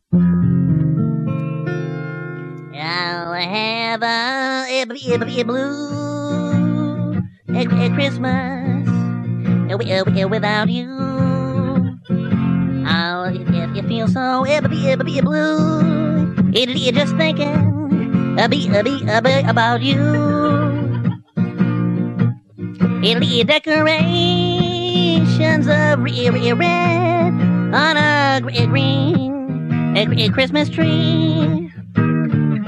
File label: childrensongs FUNNY; Porky Pig